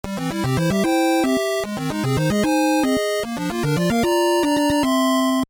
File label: music level complete